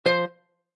Casino Electronic Sound Tone, Slot Machine: Electronic tone; Casino, gambling; Sound Effects:Casinos; Lynne Music